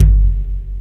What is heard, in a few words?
KIKER KLIKER, CYPERPUNK; Rubber Chicken Software Sample Bank